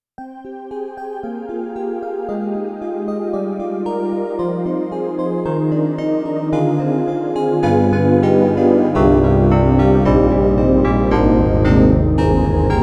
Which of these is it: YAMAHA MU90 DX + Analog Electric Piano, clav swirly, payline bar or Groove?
YAMAHA MU90 DX + Analog Electric Piano